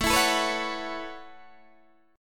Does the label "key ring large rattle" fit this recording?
No